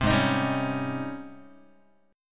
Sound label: Ab Major 11th Ab Guitar; AbM11 strummed; JGuitar